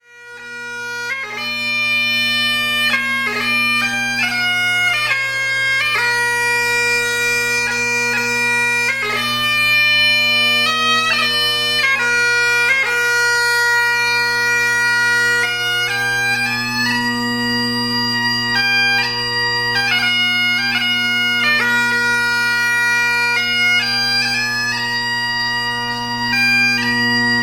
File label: Danny Boy, bagpiper